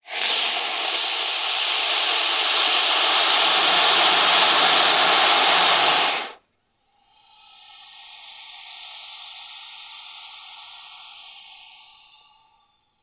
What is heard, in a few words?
Python, Burmese Hiss. Long Hiss With Inhale. Close Perspective.